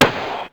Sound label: Snare, Ensoniq ASR-X Pro